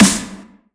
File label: snare